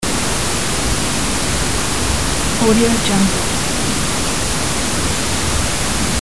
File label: white noise loop